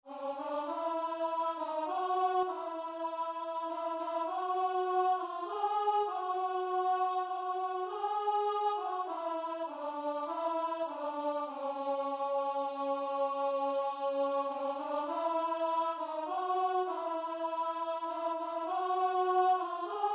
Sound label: rugged cross, guitar and vocal